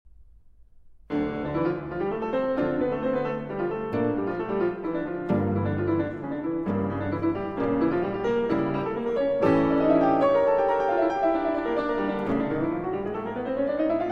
Concerto for Piano, Violin and String Quartet in D Major; Chausson; Op. 21 IV. Très animé